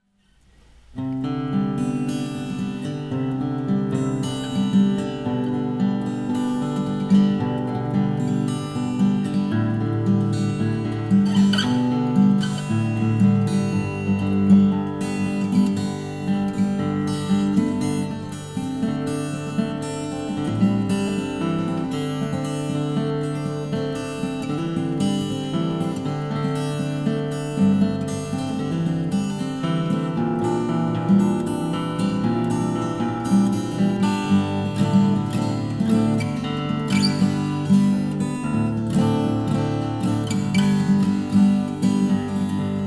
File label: Duet